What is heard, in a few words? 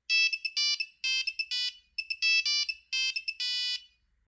nokia 5110